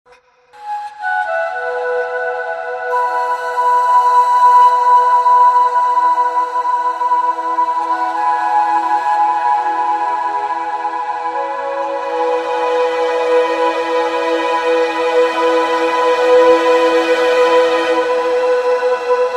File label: Shimmering Strings; Wild Columbia